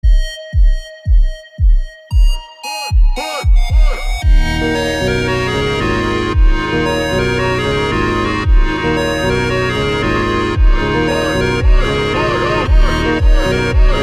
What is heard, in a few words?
Montagem Slowed; ringtone